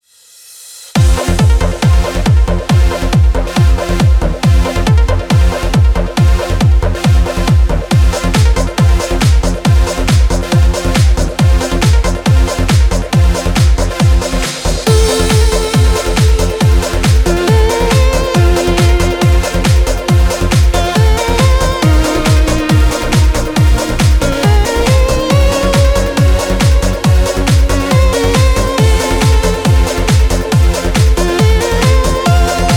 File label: 138BPM Complete Mix, Fmajor; Vengeance Samples; Another Day Kit